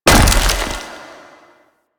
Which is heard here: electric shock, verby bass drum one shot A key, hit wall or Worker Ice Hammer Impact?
Worker Ice Hammer Impact